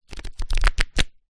household pocket photograph album wallet pages flight through